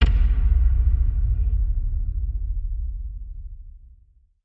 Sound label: Hit Low Echo